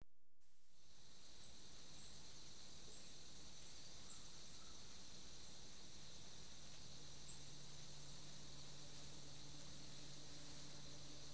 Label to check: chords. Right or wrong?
Wrong